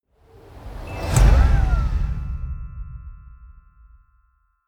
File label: whoosh playful bell